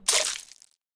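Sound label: melt enemy; Soldiers Combat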